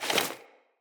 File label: Foley Action Default Melee Swipe